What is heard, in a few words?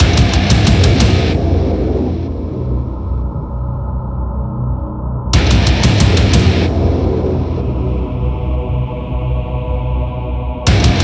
Music Song Intro; Hellbound